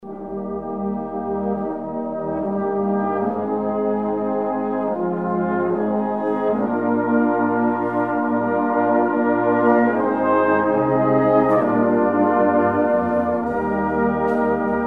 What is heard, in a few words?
Deep Harmony